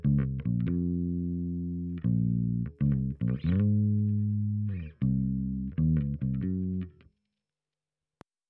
Bass DI

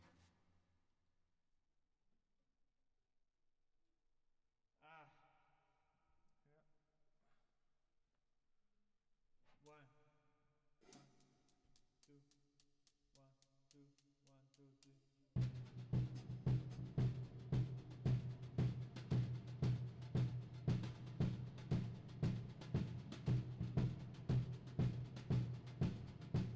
Drums Verb

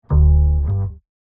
Bass, Jazz for piano

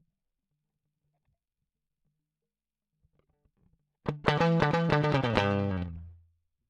Guit Solo Line

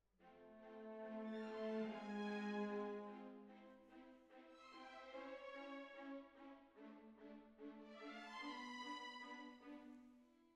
Cbasses

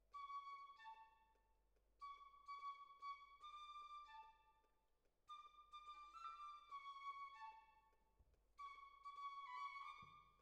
Violin Solo

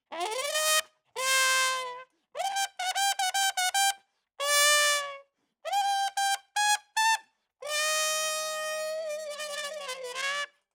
Trump; RE20

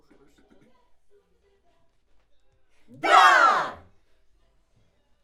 Schoeps AKG, piano

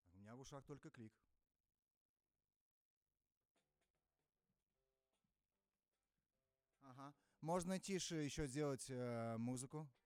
Trump

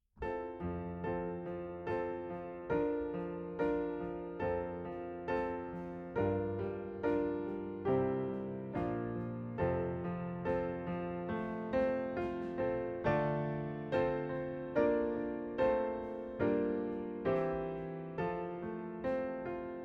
Piano A

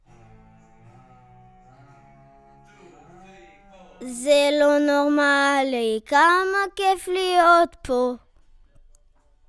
VOC

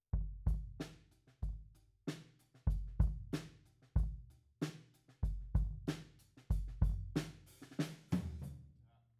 Kick Out, 94bpm

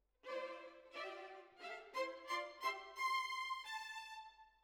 Violins Over

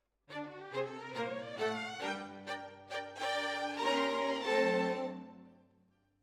Violins, jazz for piano

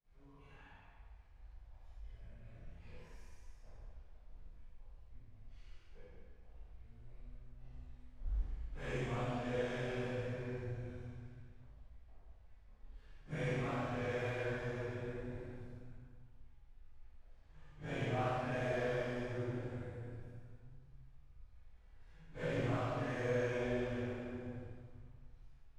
Choir

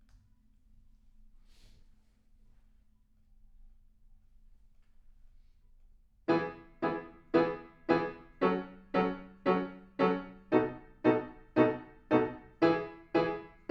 PIANO

